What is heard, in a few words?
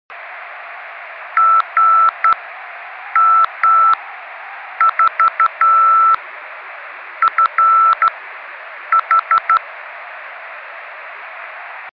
pierce osc recording